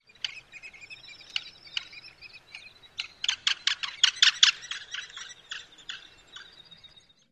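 white-headed woodpecker calls at nest with sounds of young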